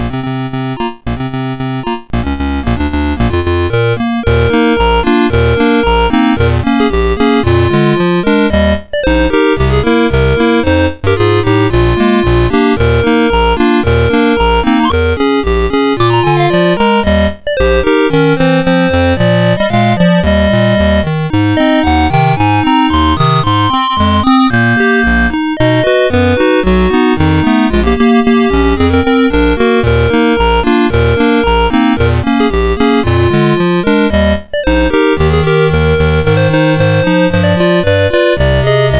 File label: Camelot 2, MOS6581R2